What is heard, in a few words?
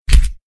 keypress return